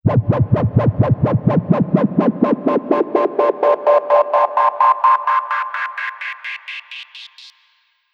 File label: FX Uplifter